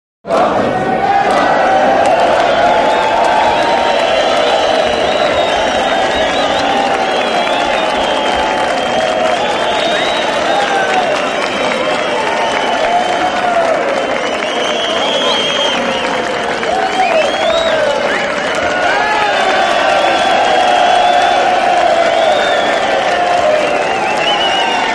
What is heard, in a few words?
Boxing Crowd Cheers